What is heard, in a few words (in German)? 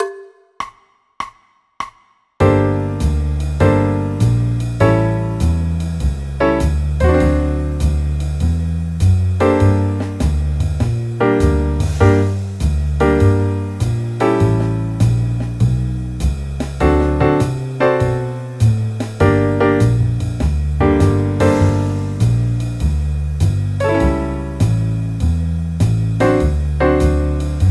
g moll akkordverbindungen